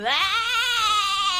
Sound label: ringtone